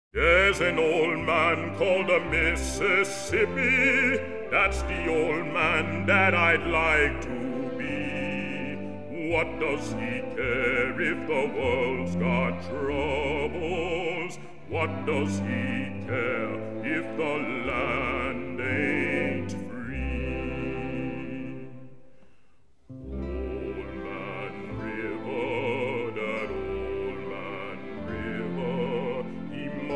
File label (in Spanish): bajo, voces graves